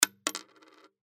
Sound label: drop